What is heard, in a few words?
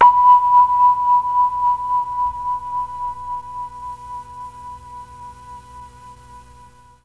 B3 VIBES, mellotron; MKII